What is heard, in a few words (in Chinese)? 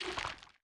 幽匿蔓延声